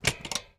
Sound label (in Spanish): cambio marcha, YBR125